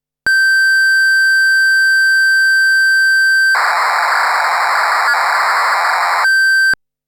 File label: Korg Trident MkII factory